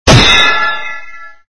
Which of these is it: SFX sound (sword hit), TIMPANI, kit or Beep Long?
SFX sound (sword hit)